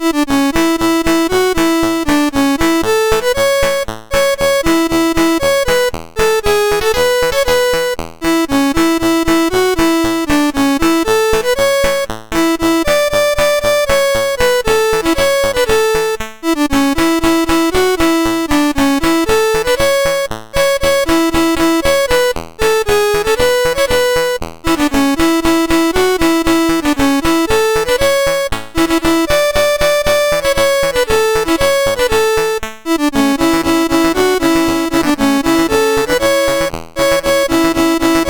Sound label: Yellow Rose of Texas, CSG8580R5